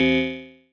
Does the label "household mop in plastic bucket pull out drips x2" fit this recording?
No